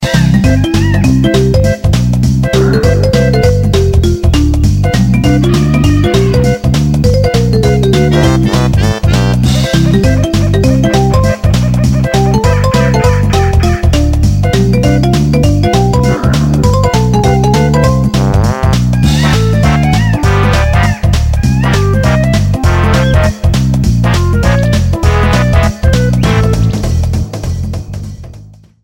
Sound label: jungle